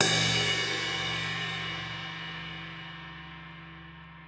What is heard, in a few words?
crash